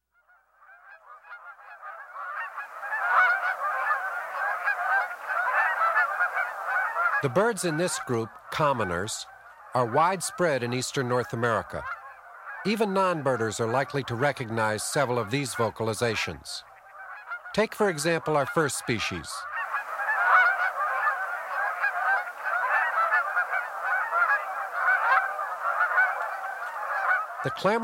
Commoners- Canada Goose, American Crow, Blue Jay, House Sparrow, Red-winged Blackbird; Common Grackle; Song Sparrow; Birding By Ear